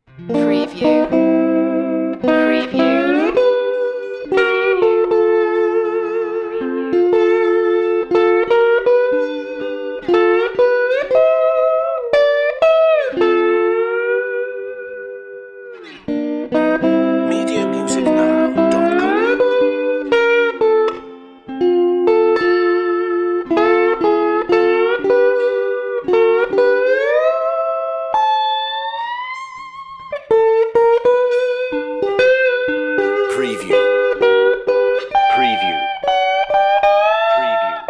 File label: silly slides